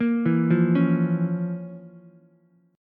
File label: F Major 7th Suspended 4th Sharp 5th down Ukulele; FM7sus4#5 arpeggiated; JGuitar